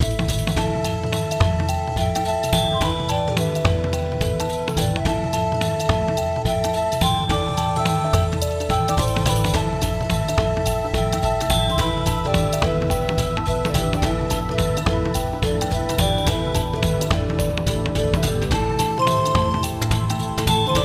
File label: Sad Forest Drum; Klonoa; Kaze no Klonoa; Door to Phantomile; Wii